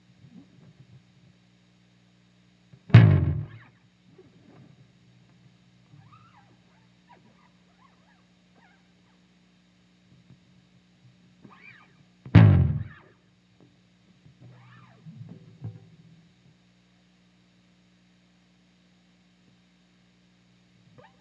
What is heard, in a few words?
bass slide